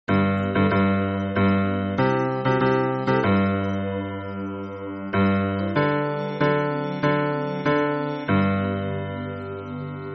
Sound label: hymn bass